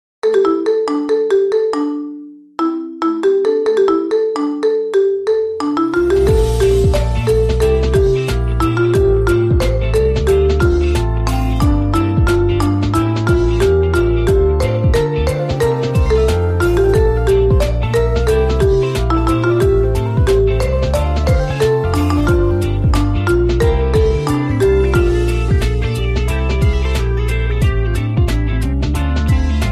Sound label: Marimba Remix